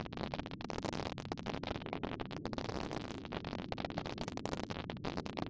fire flickering; adarkroom

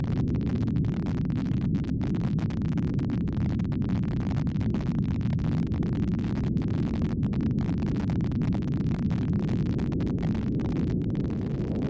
fire roaring; adarkroom

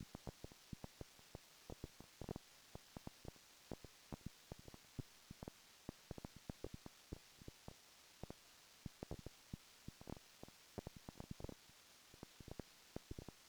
fire dead; adarkroom

